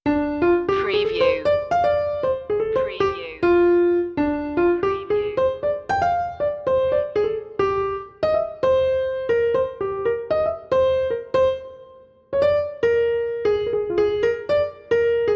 sugar piano only

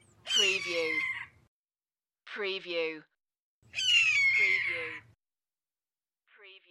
Red Tail Hawk Two Calls

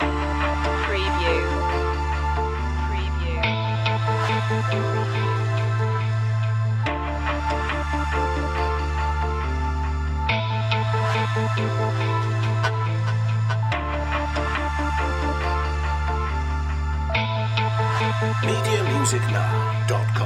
loop

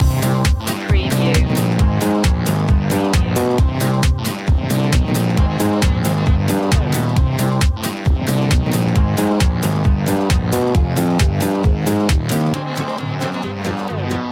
loop

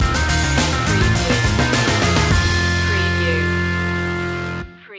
rock slam

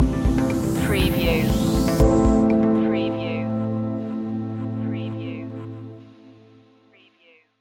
calm shores stinger

